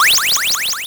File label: portal; movement